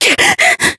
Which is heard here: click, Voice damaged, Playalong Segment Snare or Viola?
Voice damaged